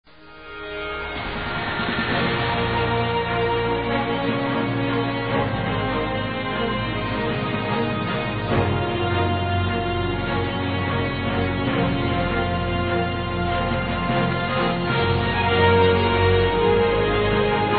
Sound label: victory